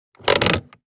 Handbrake; 手刹

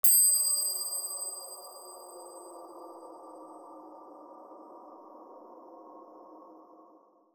Slomo Bell Atmos; 铃铛慢动作

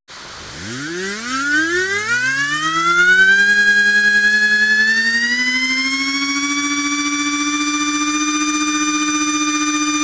Turbo Boost Acceleration; 涡轮增压

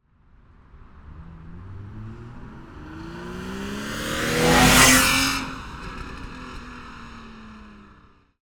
Quick and Angry Fast Drive By Left to Right; 快速驶过3

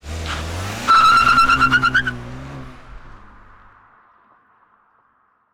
Tire Skid; 漂移

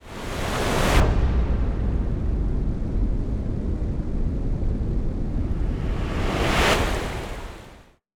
Oceanic Dive

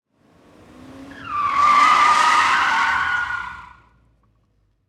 Car brakes tire skid; 漂移3

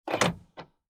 Car Unlocking; 解锁

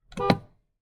Car Horn Honk; 喇叭

